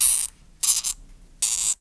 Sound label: AMBIENT; mahlendur